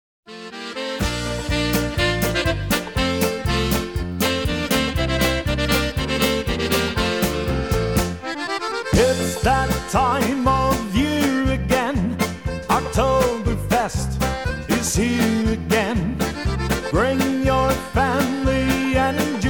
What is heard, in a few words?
Oktoberfest Polka